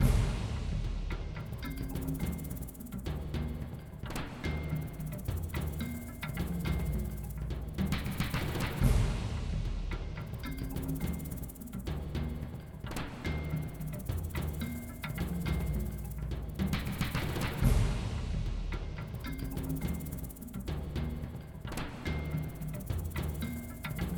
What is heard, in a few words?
Battle Drum; Final Fantasy; PS1 + PS2